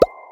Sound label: sound click